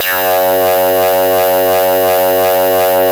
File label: MS20, LEADS; Masterbits; Vintage Voltage